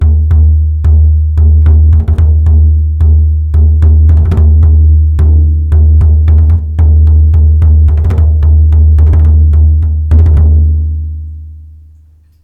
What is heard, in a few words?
Tambourine Solo